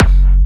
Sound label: Jump Kicks, Bassdrums